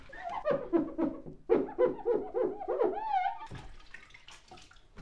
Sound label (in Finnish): ikkunanpesu